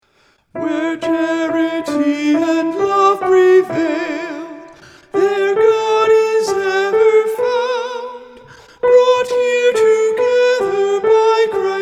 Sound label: Where Charity and Love Prevail S